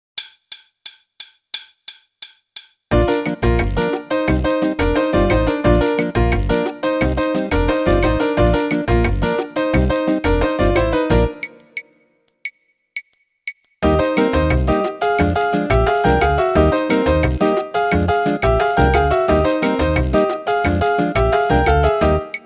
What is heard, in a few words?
Mambo